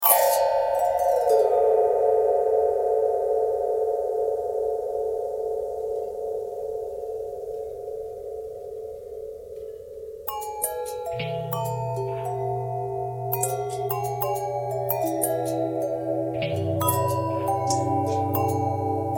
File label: long bell twist, bells